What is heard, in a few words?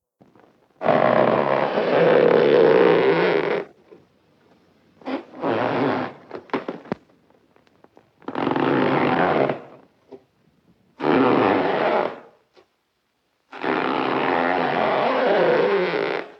Stretching Bow Or Balloon